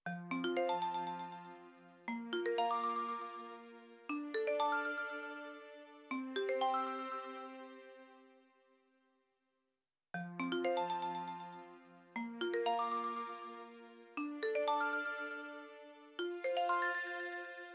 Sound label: nokia 2600 woodland ringtone